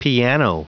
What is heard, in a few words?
piano